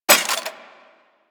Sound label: Burst Rifle Mech; Weapons; Grineer